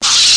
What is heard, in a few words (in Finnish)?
kriik